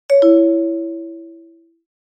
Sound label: notification